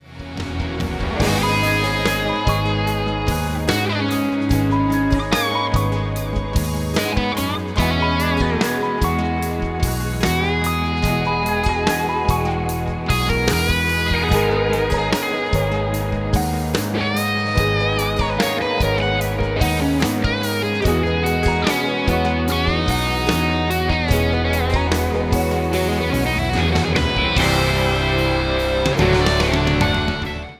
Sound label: 2Mix Flat; Daking; Fet III